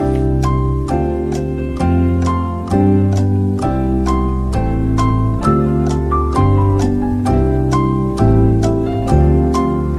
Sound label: thinking music; final jeopardy